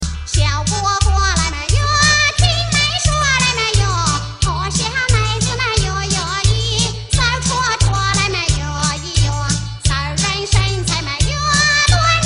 best asian ringtone

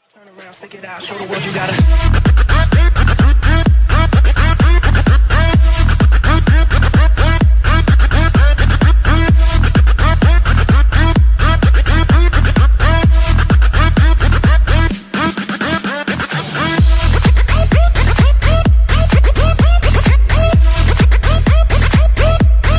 Beat Remix

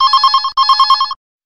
telephone

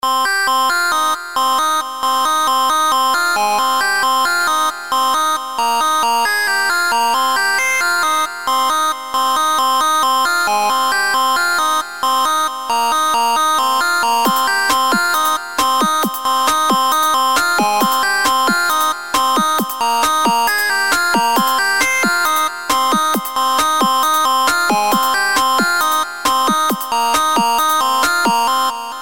Arrhythmic

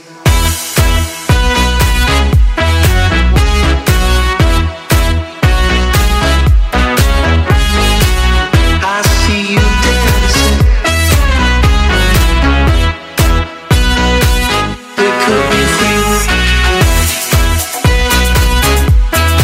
trumpets

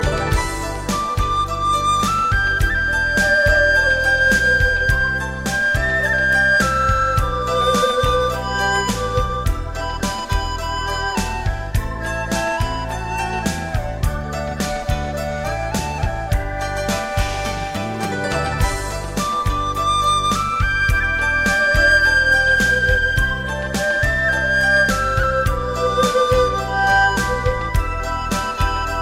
chinese music

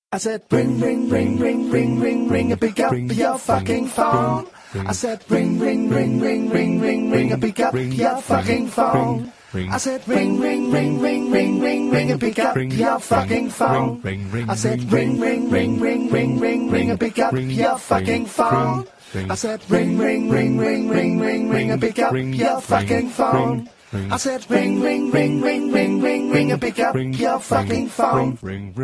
Barbershop Explicit

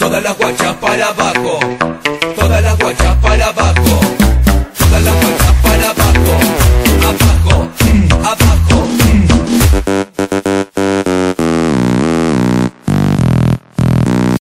Reggaeton Remix

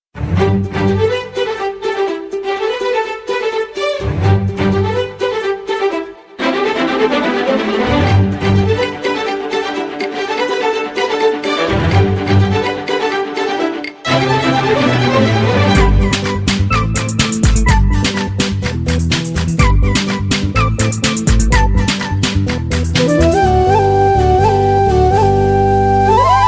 soft tune

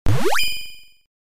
arcade credit; tiger heli

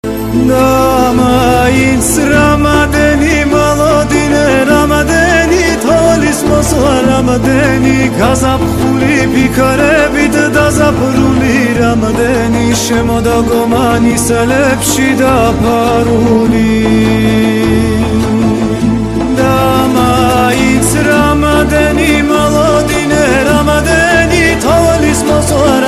Georgian dance song

